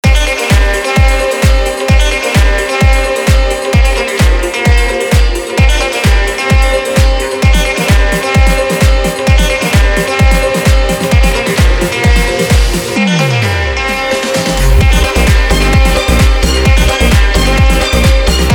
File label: Remix